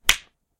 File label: slap